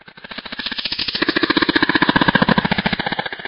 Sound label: warning, poisoncrab; svencoop; hl2